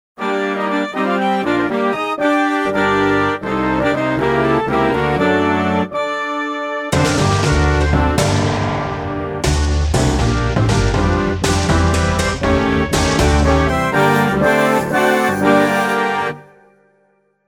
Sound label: Fanfare; Tartini; besondere Fanfaren